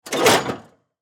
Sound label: Lawn Mower Blade, Level, Lever, Crank, Hard; John, Deer; Lawn Mowers